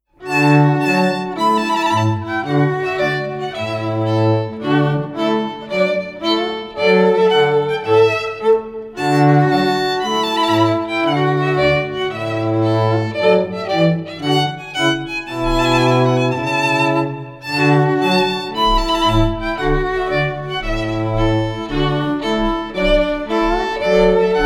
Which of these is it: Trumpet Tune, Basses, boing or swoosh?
Trumpet Tune